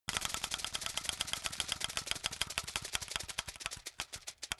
SFX WHEEL LOOP; slots; Lucky Wok

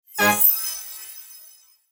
Symbol Scatter Win; Realm Saga

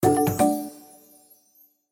Win Jackpot; slots; Realm Saga

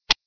chip, Roulette

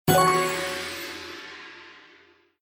Click Chest; slots; Wealth Gods2

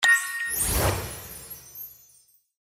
Spin Stop Win; Robot Vs Monster

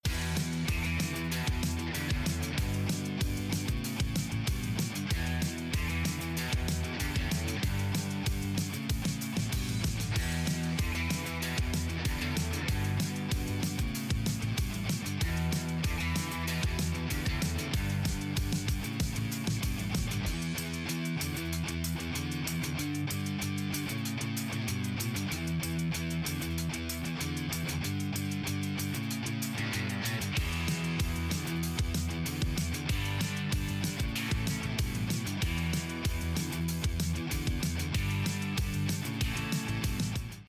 BGM Normal; Victory Lane

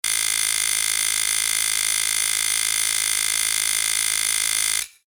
REEL SPIN; Golden Barnyard

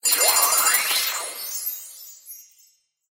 Symbol Jackpot Win; Lotus Garden

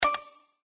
Click on Gumball dispenser; slots; Sugar Town

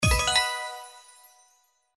SFX Symbol Regular Win; slots; Panda Majesty2

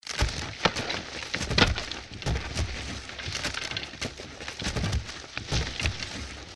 box move; Magic Number; Outdoor America

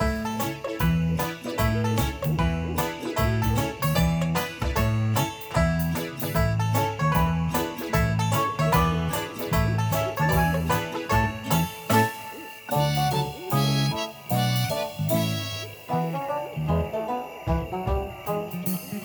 BGM FREESPIN; slots; Golden Barnyard

